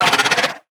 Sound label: HAMMERHEAD attack